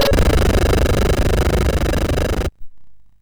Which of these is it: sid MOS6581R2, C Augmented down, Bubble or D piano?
sid MOS6581R2